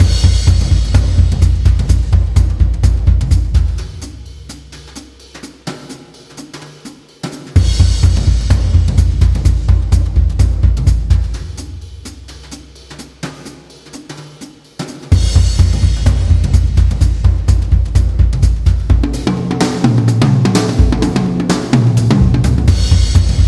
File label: Jazz Drums